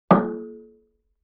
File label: Barrel Hit Resonant; Vintage Cartoon; Drums & Percussion